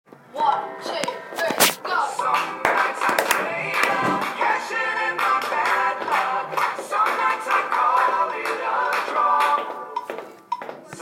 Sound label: rhythm piece